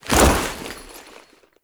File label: foley melee sweep; Warframe; Characters; Kahl